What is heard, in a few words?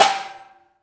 Prepared Drums